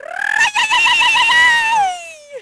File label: yell; Splashdown; XBOX